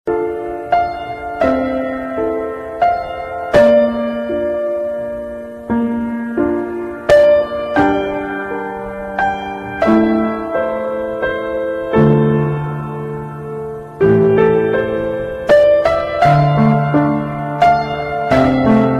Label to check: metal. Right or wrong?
Wrong